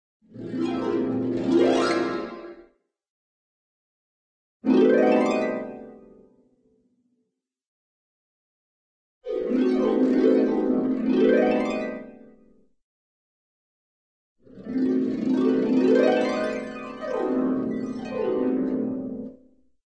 magic quick harp gliss; cartoon

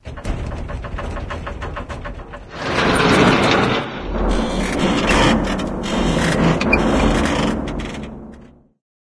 van shakes; ambient; source engine; episodic; intro

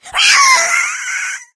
death, infected female; npc; Left 4 Dead 2; Source Engine

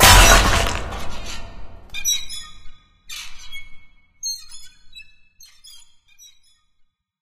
dropper break; Source Engine; Portal 2

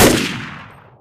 rifle fire; Left 4 Dead; Source Engine; gunfire

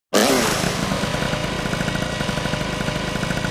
moped forward; video games; player; taunt; source engine; tf

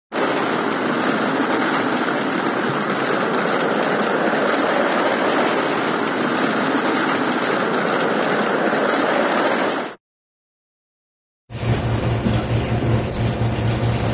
roller coaster steady running; cartoon

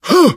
shoved, survivor voice, manager

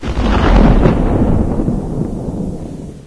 port suckin, ambience; source engine; hl1; video games

